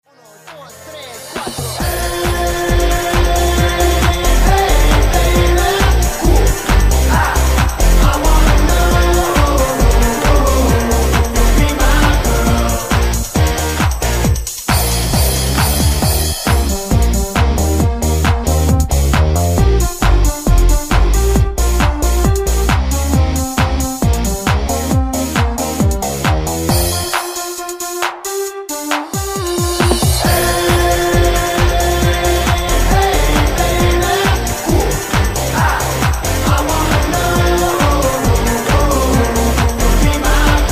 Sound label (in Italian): Ballo Di Gruppo Canto